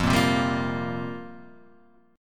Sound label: F Suspended 2nd Suspended 4th F down